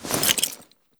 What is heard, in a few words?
draw, wpn